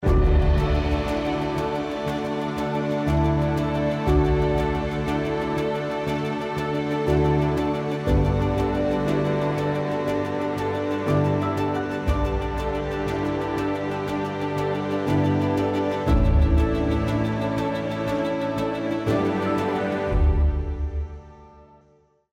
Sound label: classical; Garuda JobSpot